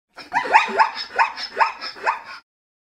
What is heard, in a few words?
zebra; animal sounds